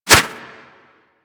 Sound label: Melee Swipe; Warframe; Kubrow